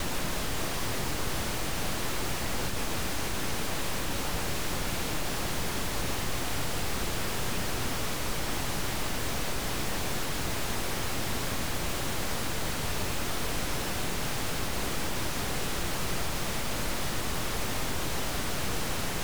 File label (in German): Rosa Rauschen -20dBFS